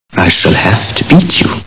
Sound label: beat